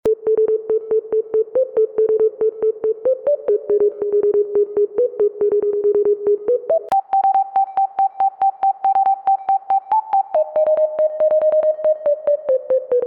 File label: horror; mr tree retake